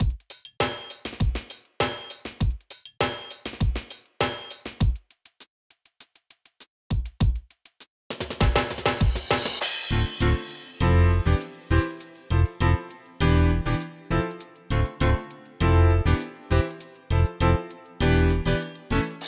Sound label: Beginner Dance No Cheering; wii fit u